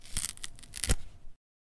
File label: flesh tearing tearing